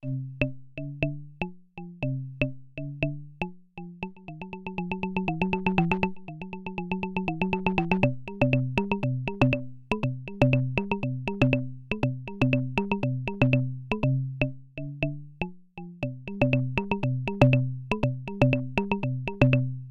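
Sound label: hand drums Moog Vocoder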